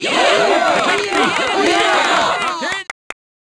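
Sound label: big burst, Crowd German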